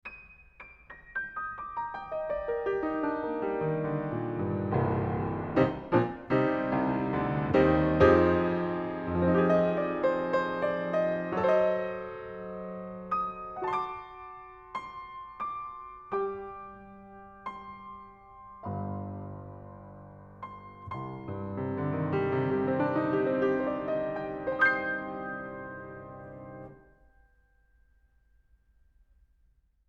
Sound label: Piano, Close Outside Mic